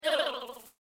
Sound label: alien; halloween